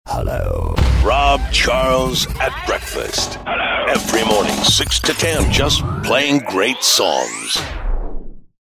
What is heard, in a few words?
breakfast jingle; rob charles at breakfast; dave foxx